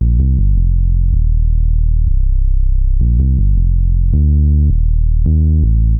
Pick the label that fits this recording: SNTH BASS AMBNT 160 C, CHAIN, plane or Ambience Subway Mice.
SNTH BASS AMBNT 160 C